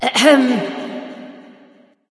clear throat light; smite; voicepack; hel

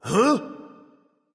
surprised; Smite; bacchus

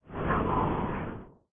float; smite; thanatos

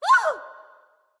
surprised; smite; nemesis

